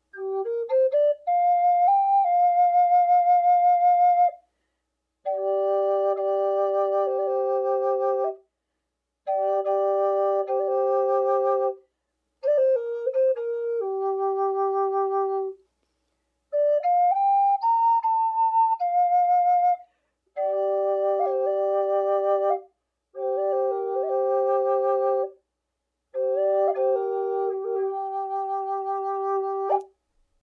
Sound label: Drone Large G Zebrawood, flutes; FlutesDrones